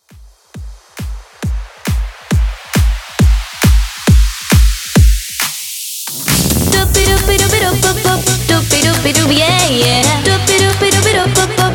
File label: Dance ReDrum 136Bpm